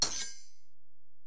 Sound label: Ac Perc, Drum Machines Alesis DM-PRO